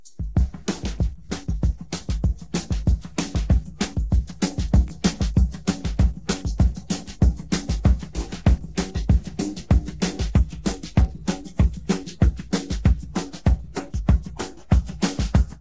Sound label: Drums